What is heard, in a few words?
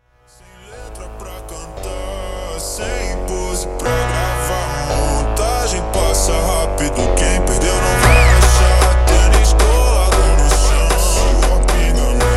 Montagem Jumpstyle slowed